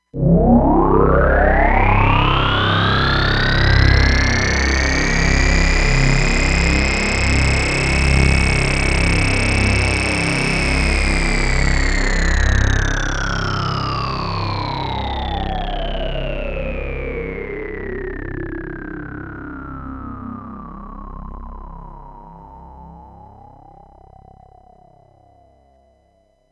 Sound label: Unison Sweep